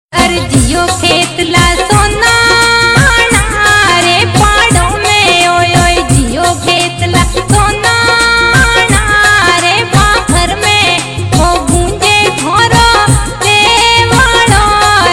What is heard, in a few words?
Marwadi Song